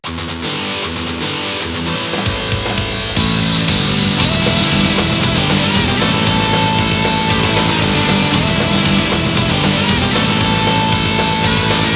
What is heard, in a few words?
Str G#